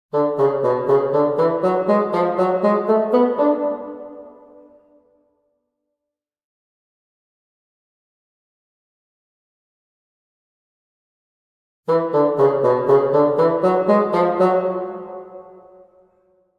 bassoon

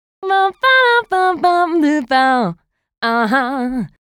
Adlib G, 70s funk

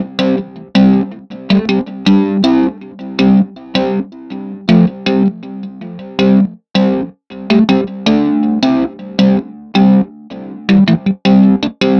Rocking EP, electro piano

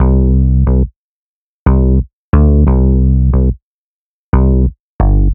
Acoustic Bass; бас; чиллаут

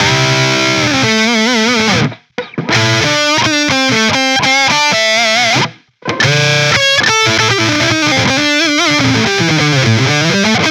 Guit Solo B6, guitar; сэмпл соло гитары; rock alternative

